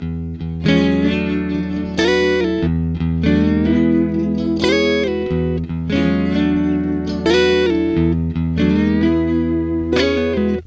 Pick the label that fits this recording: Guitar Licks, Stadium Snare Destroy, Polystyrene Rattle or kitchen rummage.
Guitar Licks